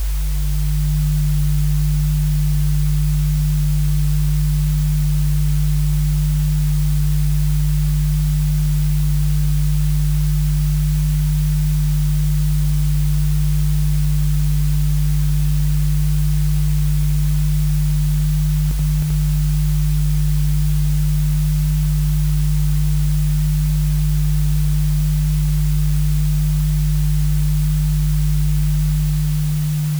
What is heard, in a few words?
Variable Velocity Lissajous Signals